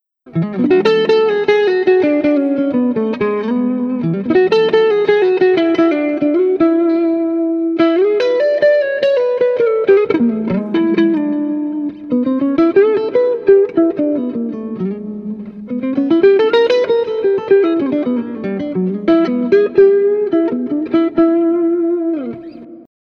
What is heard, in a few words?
strat lead licks pedal on Overdrive